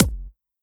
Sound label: Hi Kick; Kick Snare Layers; Drum Bits; layering